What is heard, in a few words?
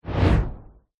sweep transition fast whoosh airy; sound design; zapsplat